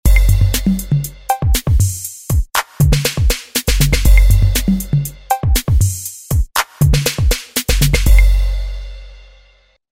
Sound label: Electro KIT, Roland V-Synth; Factory Patches